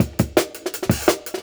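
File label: Jungle Breaks, 168 bpm; Original Jungle Breaks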